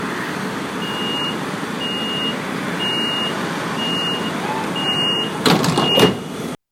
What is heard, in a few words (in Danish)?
RegioSprinter, Afgangslyd og dørlukning; 10. januar 2020, Nærum St.; Nærumbanen; Mads Bertram Liisberg